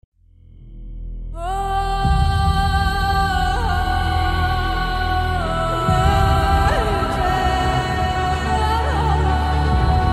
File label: Song Background Music; KGF